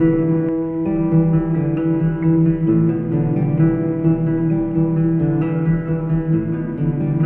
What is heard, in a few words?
guitar, Loops